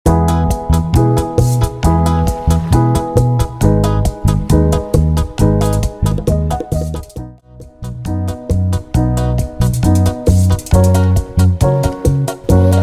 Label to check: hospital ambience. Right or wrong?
Wrong